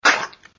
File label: Spit; Lewd SFX